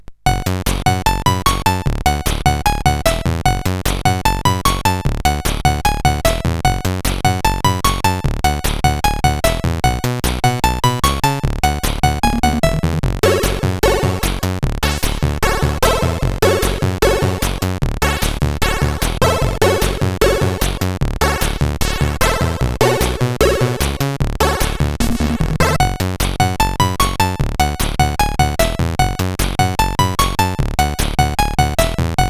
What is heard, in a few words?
Mockup, CSG8580R5; Lost Vikings